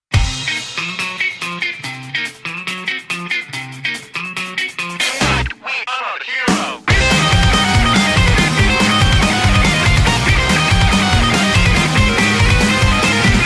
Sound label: jingle; expresso